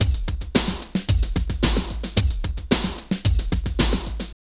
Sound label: drum loops